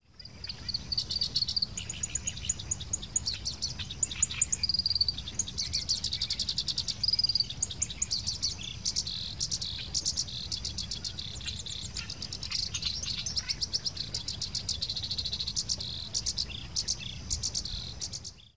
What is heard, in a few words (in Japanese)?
koyosikiri, bird song; コヨシキリ; さえずり; 新潟県新潟市北区 福島潟